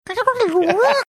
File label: making r2d2 like sounds